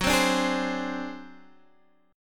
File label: A flat 7th Flat 5th G flat, chord